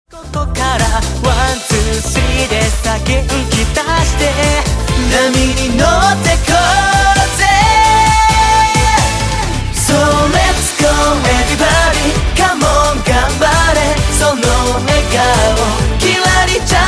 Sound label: Cha Cha Cha; チャンピオン